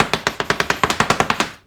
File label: writing